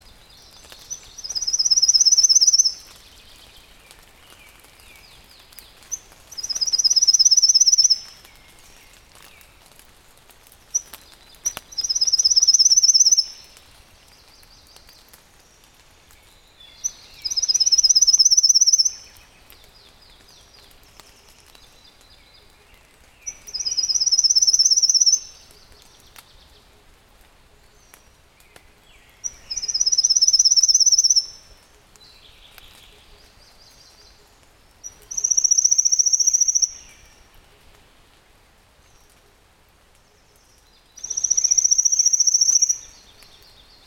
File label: warbler pale legged leaf Phylloscopus tenellipes